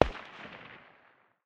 ak 556 far distance, 5.45x39mm; tacz-tweak-pack; taczmechanics

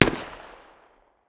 smg 45 medium distance; tacz-tweak-pack; taczmechanics

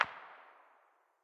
pistol very far distance; 9x19mm; tacz-tweak-pack; taczmechanics

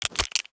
taurus reload start; daffas arsenal; tacz

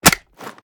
g36 mag in; daffas arsenal; tacz

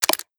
taurus cook; daffas arsenal; tacz

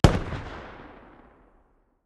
hmg m2 medium distance, 12.7x99mm; tacz-tweak-pack; taczmechanics

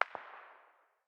smg very far distance; tacz-tweak-pack; taczmechanics

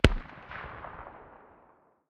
hmg dshk far distance, 12.7x99mm; tacz-tweak-pack; taczmechanics

